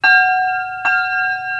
Bell, Trains

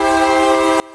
Nathan K3LA Short, trains, horns

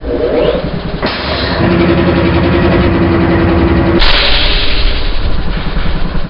Compressor, Transport Trains

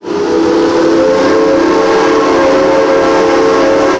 Steam Whistle; Trains; Whistles; PFx Brick

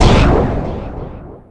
Laser Weapon, sci fi; soundfx

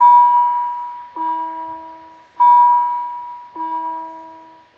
Crossing, Trains; PFx Brick

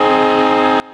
Nathan M5 Short, Transport Trains Horns

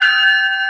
E Bell, Trains Bells; PFx Brick

